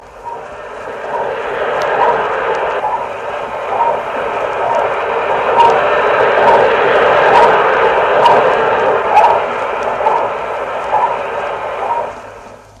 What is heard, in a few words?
heart of Laika in Sputnik 2 in the 4th orbit; recording of the heartbeat of the dog Laika aboard Sputnik 2; The recording is from vinyl no. 2